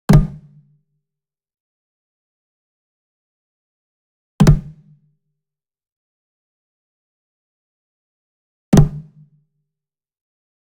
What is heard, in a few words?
Wood Percussion Wood Drum Mounted Flam Slap Near; boom library; Cinematic Strikes Construction Kit